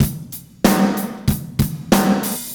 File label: Toyo loop 93bpm; Sonic Foundry (Sony Creative Software); Hip-Hop Street Beats Construction Kit; Street Beats by Poogie Bell